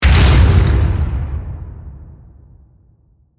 Hit Blast Slam, Heavy; Production Element, Imaging Element, Accent, Transition; BlastWave

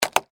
Skateboard Flip, Mini, Up, Cement, Surface; Sports, Skateboard; Skateboarding Sound Effects; BlastWave

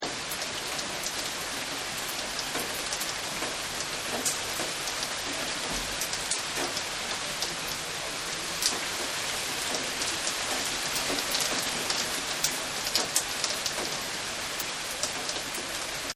Rain on Roof; Rain Med Concrete; Weather

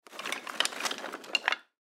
Grab Wood From Pile; Fireplaces; Household; Blastwave; Foley

